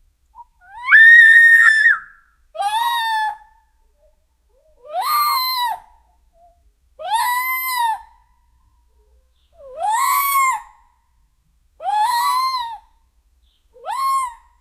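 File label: monkey chimpanzee sound, animal call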